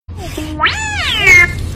yell ringtone

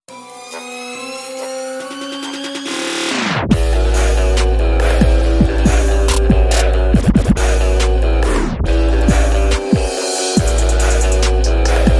trap remix ringtone; james bond

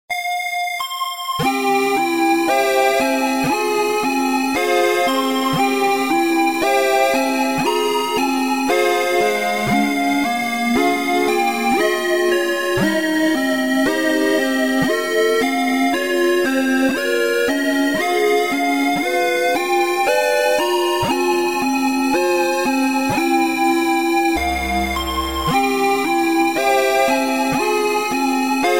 8 bit ringtone

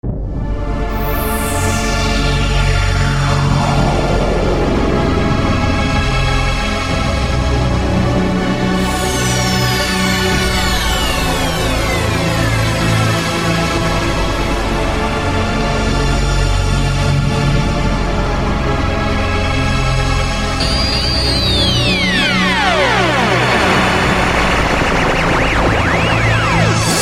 gothic ring ringtone

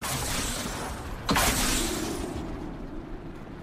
jump pad ringtone

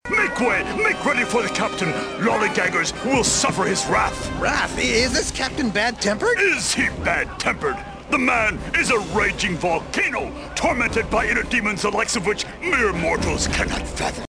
bad tempered ringtone

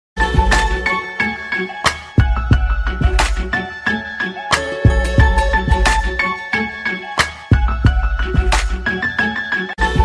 instrumental ringtone

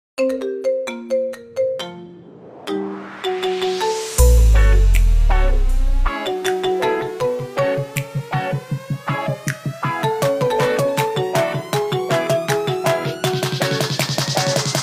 marimba remix ringtone

